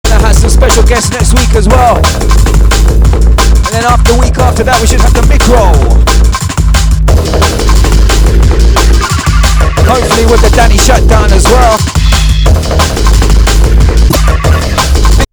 Drum and Bass Jungle Old Skool, UK Bass Radio

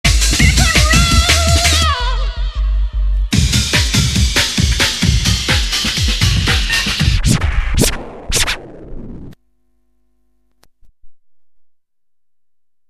Drum and Bass Jungle Old Skool, UK Bass Radio

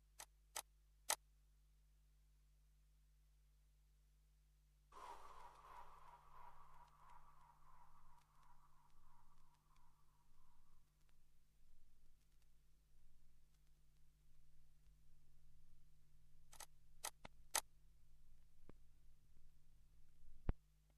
Drum and Bass; UK Bass Radio

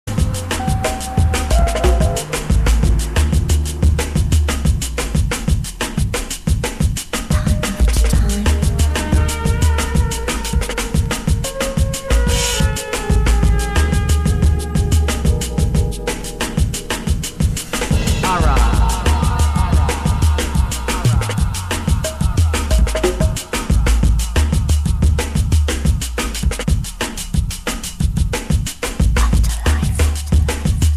Drum and Bass Jungle and Old Skool; UK Bass Radio

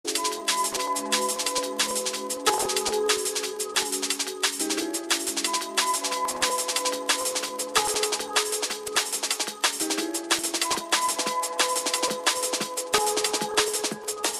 Drum and Bass Jungle; UK Bass Radio

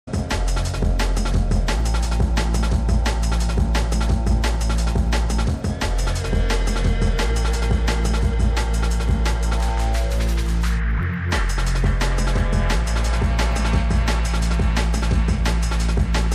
Drum and Bass Jungle and Old Skool, UK Bass Radio

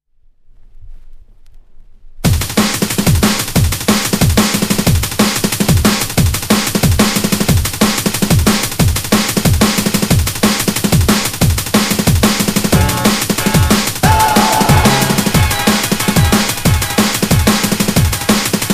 Drum and Bass Jungle and Old Skool; UK Bass Radio

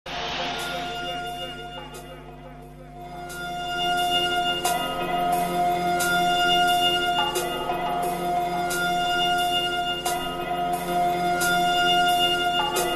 Drum and Bass Jungle Old Skool, UK Bass Radio; the Federation Show LIVE

